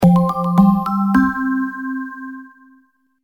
Fanfare Start Saving; Ranger

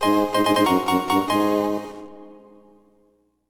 Fanfare!; Item Obtained; FRLG

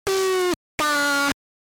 Pikachu SFX; RBY

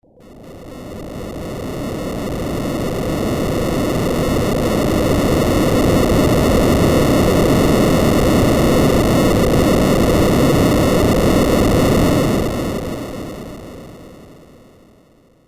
Rumbling; FFVI

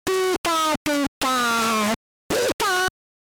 Pikachu SFX; RBY

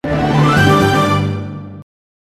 Fanfare - Evolution Starting

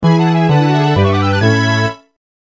Fanfare Capture Complete; Ranger

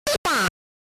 Pikachu SFX; RBY

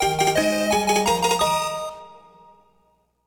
Fanfare!; Pokemon Obtained; FRLG

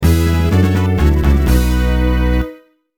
Fanfare - Level Up; Ranger SOA

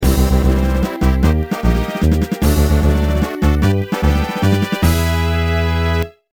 Fanfare; Ranger

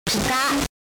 Pikachu SFX; RBY

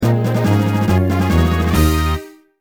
Fanfare Misson Assigned; Ranger

